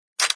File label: Machinegun Wield; Pain Train